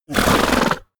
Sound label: horse vocal snort